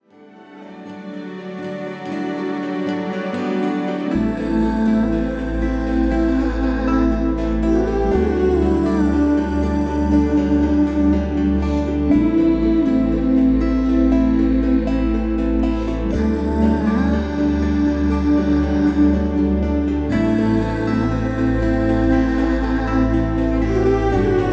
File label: Instrumental